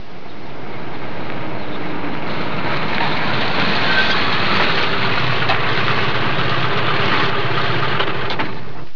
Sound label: JEEP